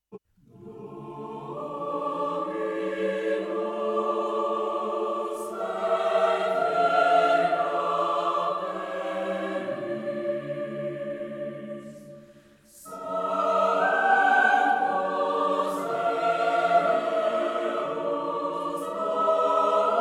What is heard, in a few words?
Choral Music I. a Cappella; Napoleon: Total War